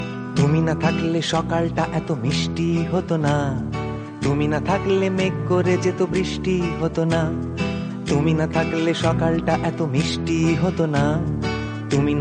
bangali ringtone

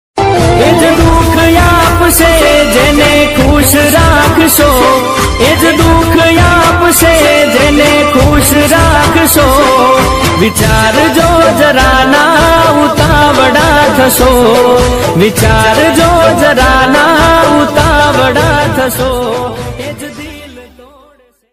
gujrati ringtone

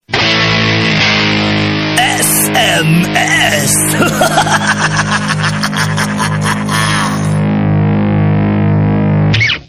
evil sms ringtone

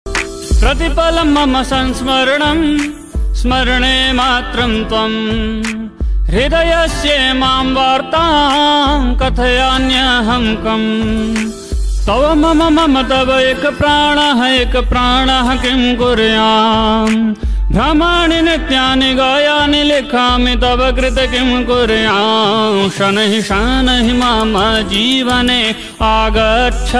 sanskrit ringtone

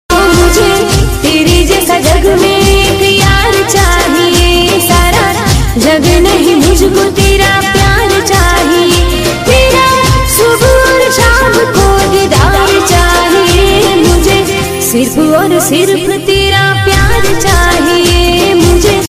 gujarati emotional ringtone

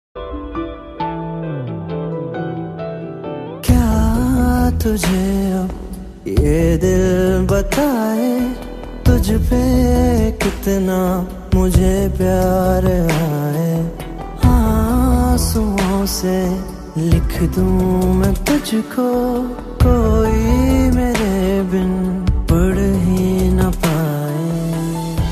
romantic bollywood ringtone

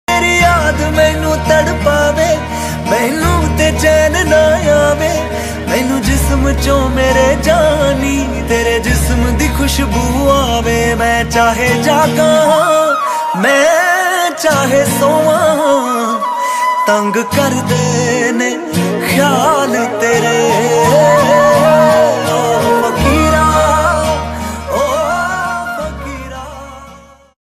latest sad ringtone

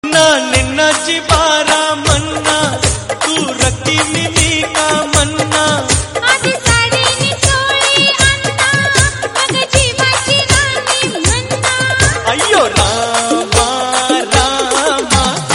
marathi song ringtone

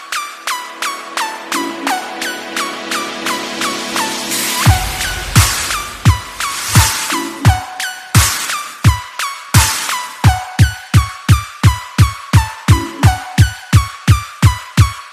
cool electro ringtone

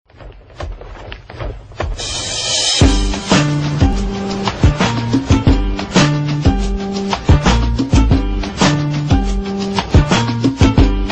ringtone

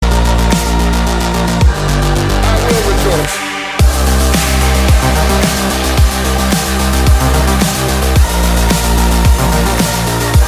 iphone x ringtone

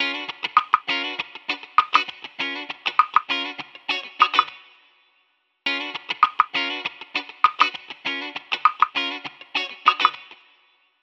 samsung galaxy j7 ringtone

